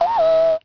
F happy; People

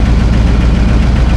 car; WhiteOut

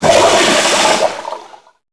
urinal flush; toilet; Max Payne

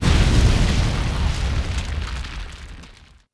hit; XBOX; Crash Bandicoot The Wrath Of Cortex

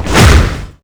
bullet hit melee; projectiles; Max Payne

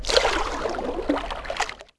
Water Stir

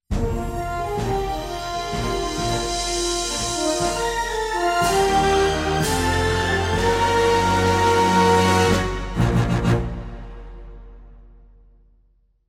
Ship victory, MUSIC; Pirates Of The Caribbean

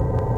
generator electric; Soldier Of Fortune II Double Helix; XBOX; ambience

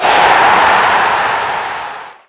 Medium Cheer, Crowd; David Beckham Soccer; XBOX

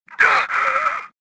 gasp

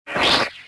hologram off

